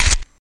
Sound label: Celery Snap; gore stabs & eat